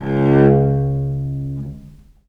C#1, Historical Instruments, VIOLE MUT; USB Soundscan vol.23; AKAI

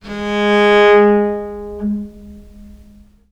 G#2, Historical Instruments, VIOLE MUT; USB Soundscan vol.23; AKAI

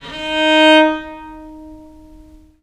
D#3, Historical Instruments, VIOLE MUT; USB Soundscan vol.23; AKAI

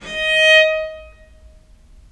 D#4, Historical Instruments, VIOLE MUT; USB Soundscan vol.23; AKAI

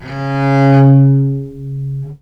C#2, Historical Instruments, VIOLE MUT; USB Soundscan vol.23; AKAI

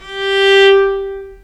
G3, Historical Instruments, VIOLE MUT; USB Soundscan vol.23; AKAI

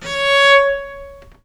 C#4, Historical Instruments, VIOLE MUT; USB Soundscan vol.23; AKAI

